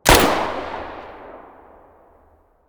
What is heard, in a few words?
muzzle mono distant, weapons